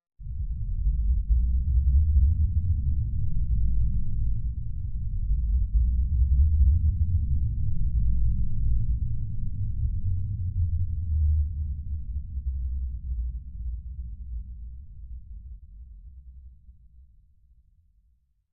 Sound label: Deep